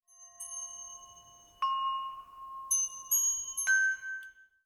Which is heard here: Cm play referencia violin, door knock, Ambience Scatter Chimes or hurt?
Ambience Scatter Chimes